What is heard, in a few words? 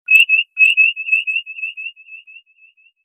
Sonar; Star Trek